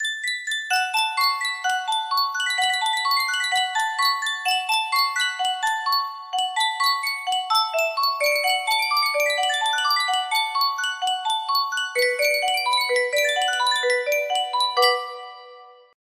yunsheng music box

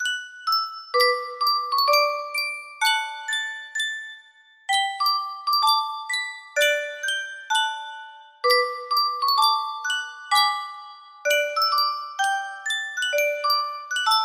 sankyo music box, music box melody

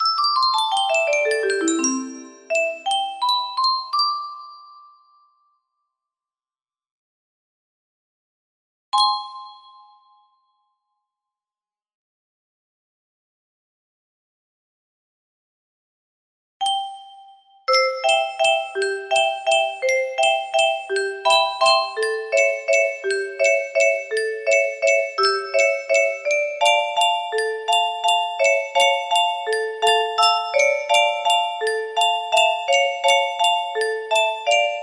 duet, music box; omori

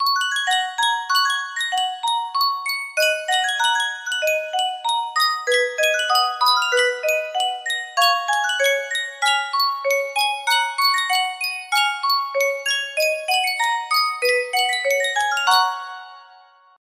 Yunsheng custom tune music box

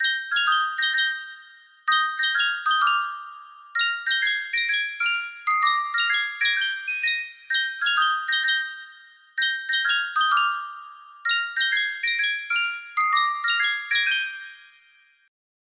spieluhr, music box melody

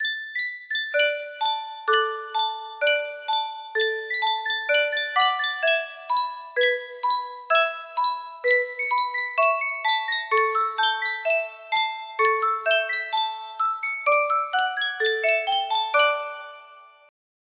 yunsheng music box, melody

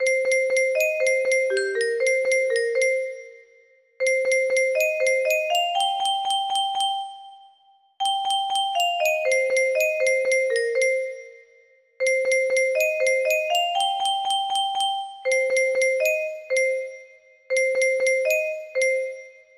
melody

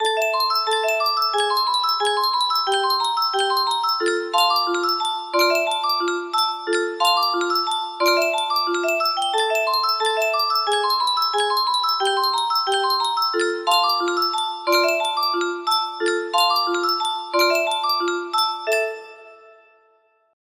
altered for faster sound, music box melody

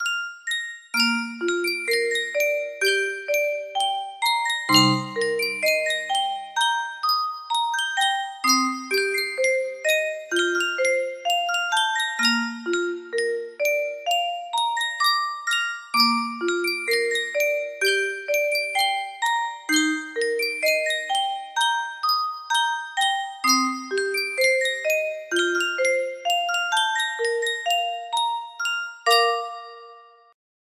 yunsheng 30 note music box

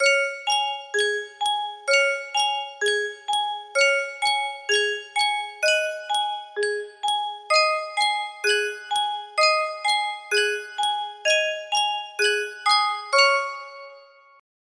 Sankyo Music Box, music box melody

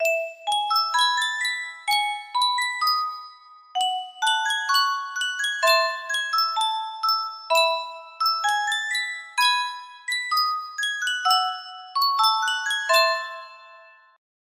Yunsheng Music Box, music box melody

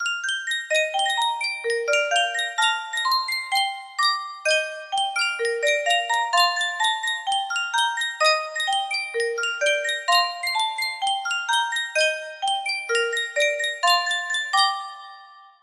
Yunsheng music box, music box melody

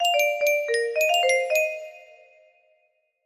melody

